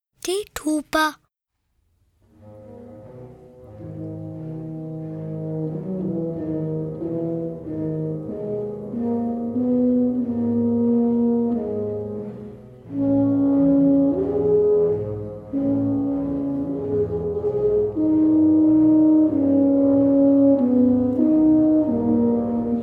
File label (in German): Tuba; Bilder einer Ausstellung